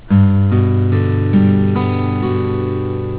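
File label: Gmaj7 chord, guitar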